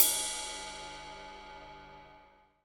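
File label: ride cymbal, Real Kit; 90 s Sample CDs; Sound & Vision - Gigapack I CD 1; Real-Kit s 4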